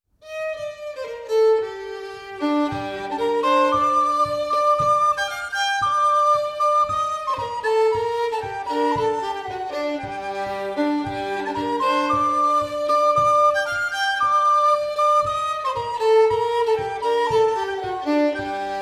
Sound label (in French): Bourrée, Bourrées en Berry et Bourbonnais; Daurore Sand